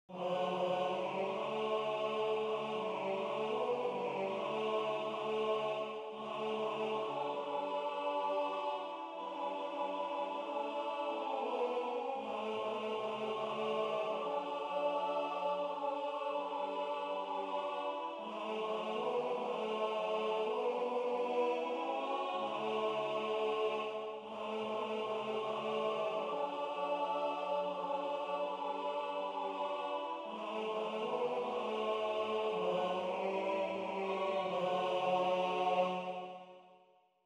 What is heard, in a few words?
Tenor